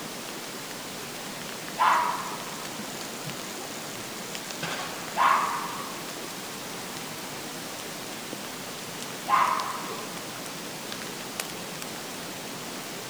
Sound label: red fox calling